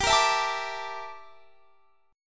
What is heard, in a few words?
G Minor 6th Add 9th G down Guitar Standard; Gm6add9; strummed; JGuitar